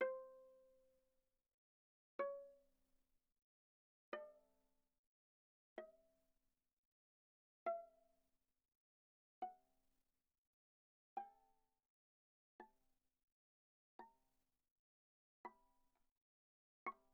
Viola pizz sul D pp C5 B5

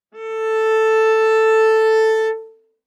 Viola arco ff sul D A4 stereo; MIS Pitches - 2014; Strings

